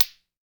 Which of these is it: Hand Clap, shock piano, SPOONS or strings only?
SPOONS